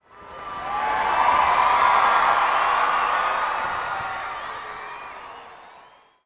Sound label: crowd Reaction arena small Reveal; spellbound